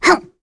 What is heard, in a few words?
Jump; Nia; kings raid; voices heroes en